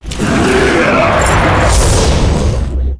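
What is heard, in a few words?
death; Claudell01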